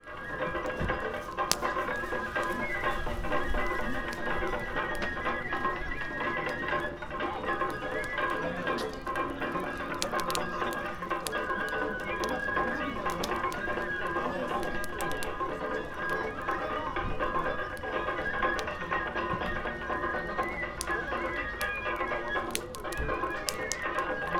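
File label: shinobu gokoku, Fukushima Soundscape; shinobuyama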